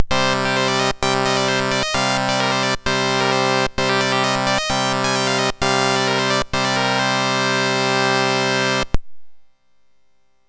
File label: sid MOS6581R2; Nine Princes in Amber